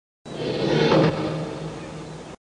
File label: door close